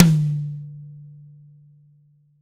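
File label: TY High Tom, Roland BK-5 Toms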